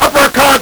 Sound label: LSDj, Nintendo Gameboy; 300 Drum Machines